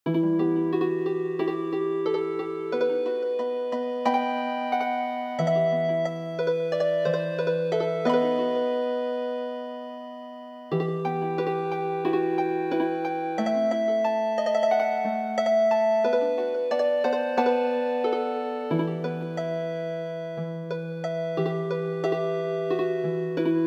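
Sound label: Healing Harp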